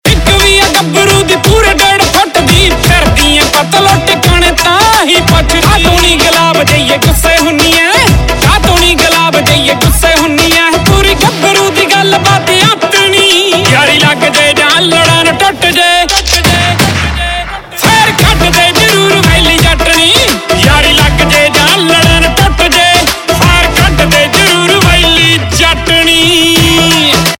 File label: Ringtone, Punjabi